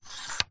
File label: technology mixing desk fader slide; zapsplat